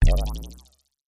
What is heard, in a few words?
synth boing; boings